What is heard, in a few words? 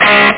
heavy slap, guitars